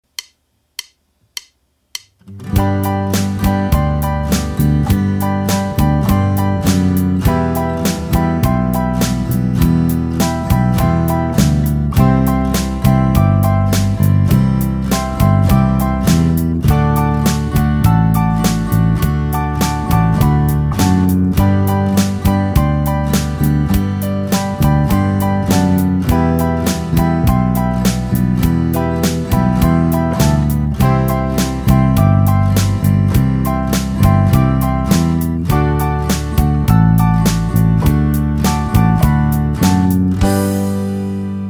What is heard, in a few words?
Walking Two Beat Fast